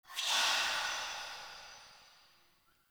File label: Quest Grave Activate; Orlick; Friendly